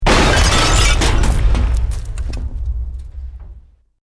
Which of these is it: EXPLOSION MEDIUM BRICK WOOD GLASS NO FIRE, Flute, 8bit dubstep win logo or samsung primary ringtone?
EXPLOSION MEDIUM BRICK WOOD GLASS NO FIRE